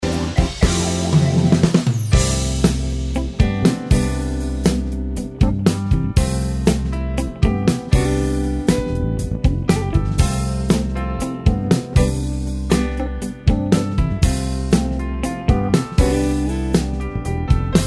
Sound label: Guitar Version; Fortnite